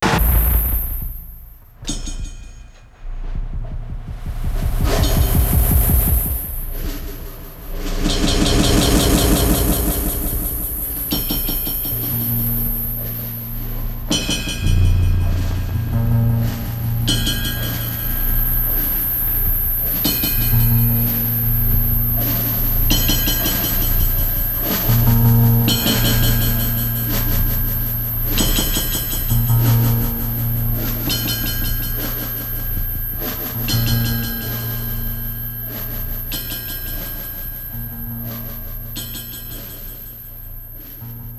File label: Above and Under Our Piano